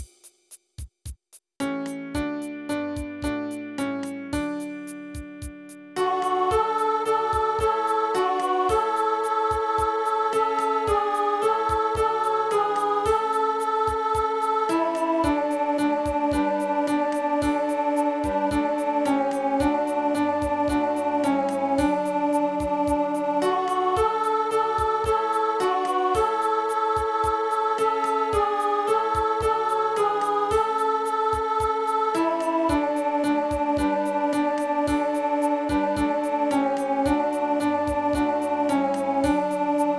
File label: Alto; Carols; A Child This Day Is Born